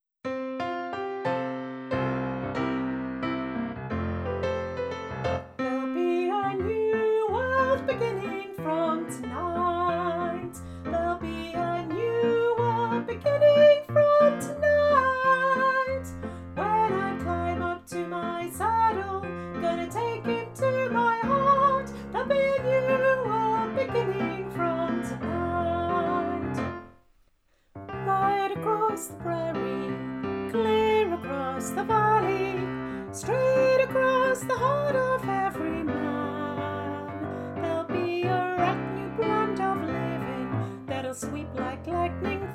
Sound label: cowboy carol full vocal